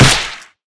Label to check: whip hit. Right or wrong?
Right